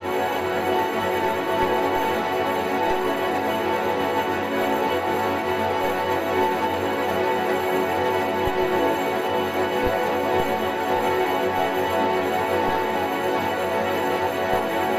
granular, pad

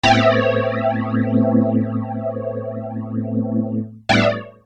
Good Chords FX; Construction Kit; HIP-HOP; Sonic Foundry (Sony Creative Software)

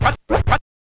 scratch short, Vinyl scratches

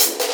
hat; Sonic Foundry (Sony Creative Software); Ugly Remnants VOL-2; Beatstuff

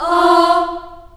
F3 CHS AH-R, CHILD AHS, Choir; Acoustic & Synth; AKAI; USB Soundscan vol.28

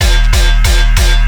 gun attempt

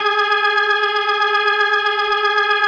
B3, First Call Vintage Keyboards

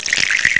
Water; ST-XX Amiga Soundtracker Sample Packs

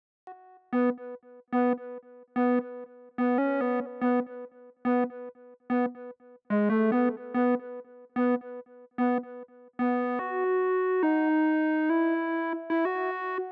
square lead, Smooth RnB, F#min

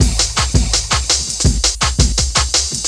Break, Jungle; M8

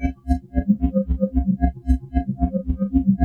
VECTOR LO, VECTORING; Zero-G Ambient 1

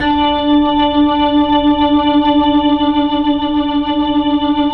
HAMMOND 3D L; 3D Sound; 3D Keys VOL-1

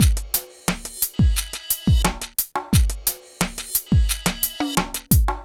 PHATT BEA

